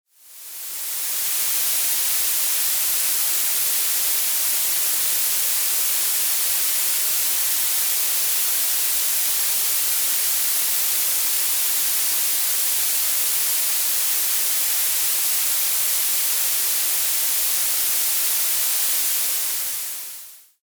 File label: blue noise extra smooth analog neve source